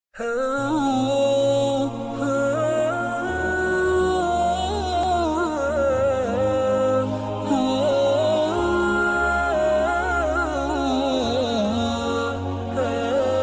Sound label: islamic background theme music ramzan special ringtone